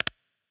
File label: mouse click; Burning Reels; wazdan